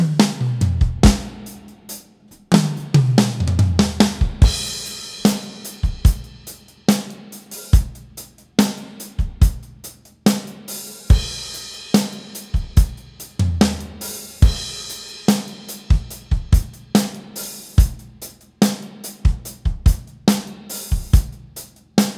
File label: Masterroom XL 305 Drums